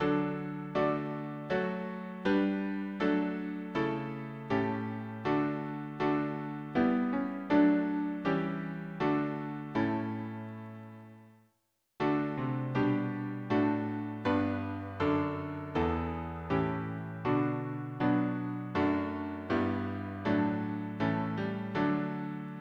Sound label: harmony piano